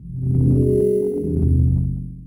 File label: Scifi Hover Sentry Loop; SFX Kit; Sci-Fi Loops